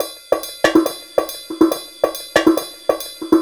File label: Addon Loop, Drum Loops 140bpm, Perc Loops